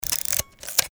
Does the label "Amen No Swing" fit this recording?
No